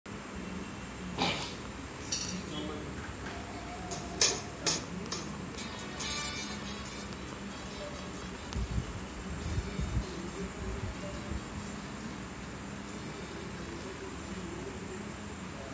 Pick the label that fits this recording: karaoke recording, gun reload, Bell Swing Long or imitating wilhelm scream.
karaoke recording